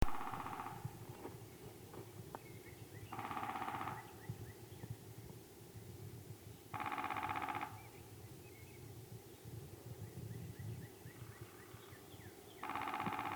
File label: downy Woodpecker